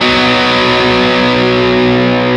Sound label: x x 4 4 2 Bm; osu